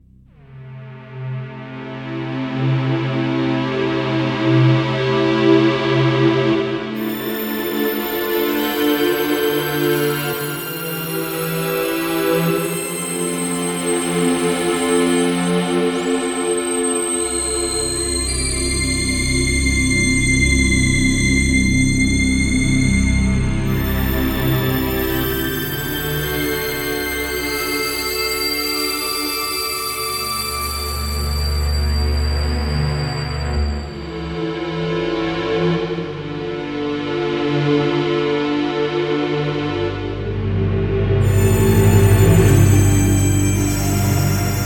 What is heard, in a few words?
Padded Unit of Moog